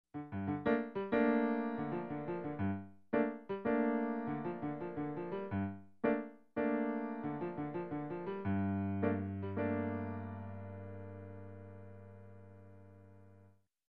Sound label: Vintage Jazzy Piano Line